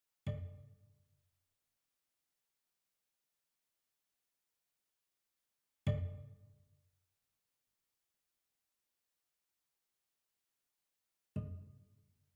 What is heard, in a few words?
sound event clo; devolution